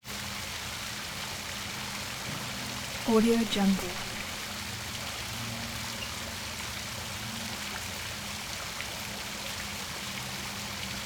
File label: fountain constant water flowing splashing; prosoundfx